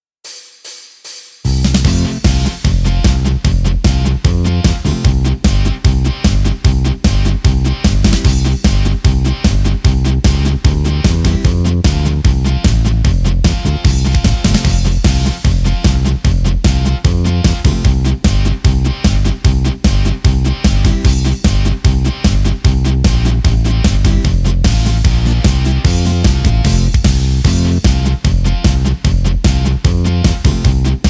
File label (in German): Polka Hörprobe G C F B